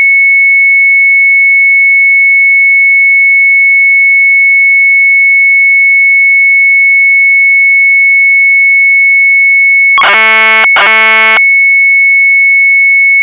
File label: MZ800 Tape; AUTO RUN; sharp; retromelsarchive